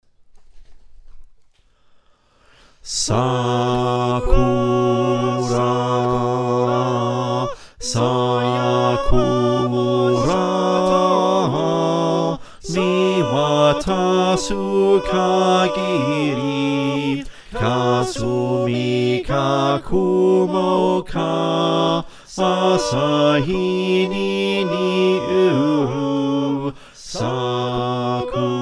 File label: Bass, choir; Sakura